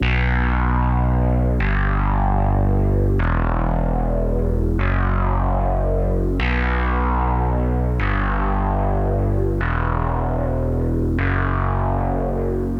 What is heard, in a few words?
150 BPM B Min Prophet 5 Bass; Synth; Loop Stems; Cymatics; Fantasy Synth Sample Pack; Universal